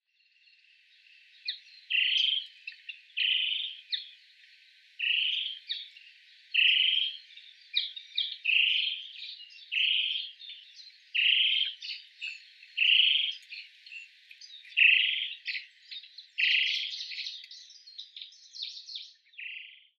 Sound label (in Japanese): akakokko, koe; kuroda